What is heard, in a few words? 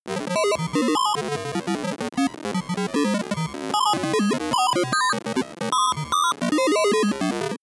broken computer trill